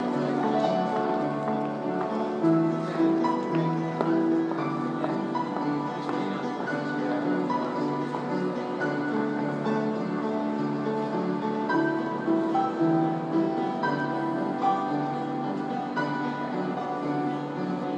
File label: st pancras piano